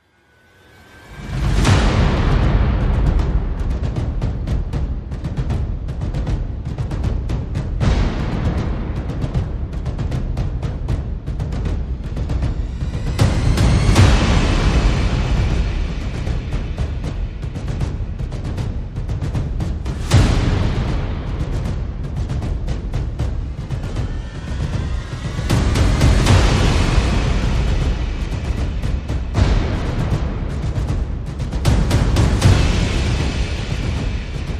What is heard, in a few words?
Perc And FX Only